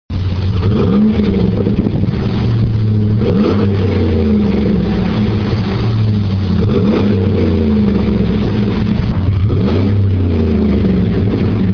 Silverado 5.3L Dual Extreme

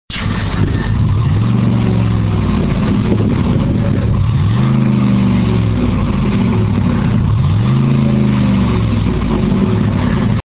Explorer 4.6L Single

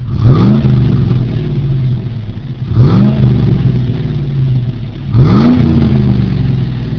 chevy tahoe 5.3l dual sport; Chevy/GMC

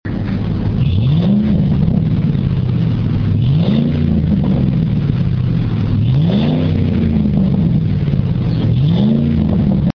F-150 5.4L Split Rear

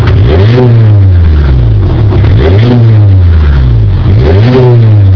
honda element 4.0l single

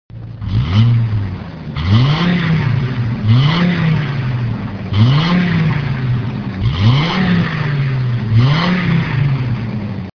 Colorado (fly by) 3.5L Single

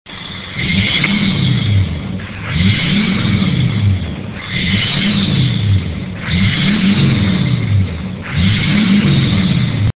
2500 HD Diesel fly by 6.6L Swept Side 4"

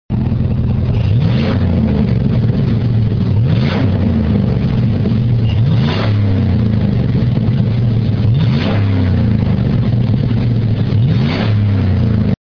1500 6.0L Dual Sport

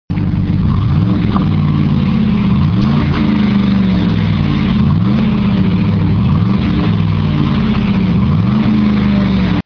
Explorer Sport Trac 4.6L Single

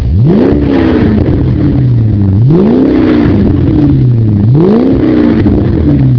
stsv 46l supercharged dual, Cadillac

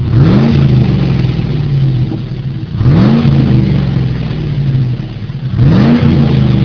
chevy tahoe 5.3l dual extreme; Chevy/GMC

